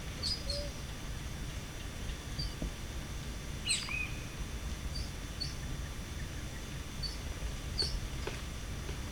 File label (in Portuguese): canto do saira de pernas pretas